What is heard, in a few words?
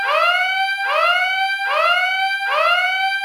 helicopter alarm loop; Rust